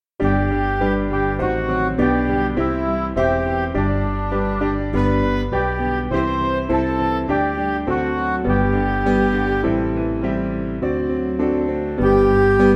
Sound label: Midi; Christ Whose Glory; Spanish Hymn